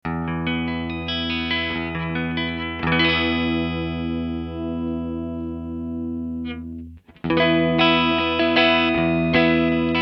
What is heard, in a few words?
Sapphire